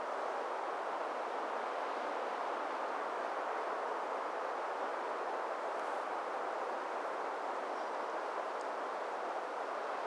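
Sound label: snowy wind loop; ambient soundscapes; dota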